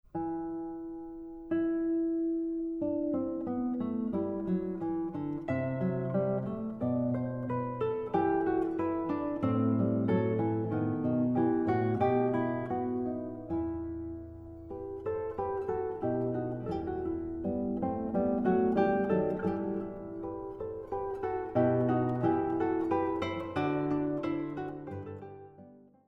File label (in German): Gitarren Duo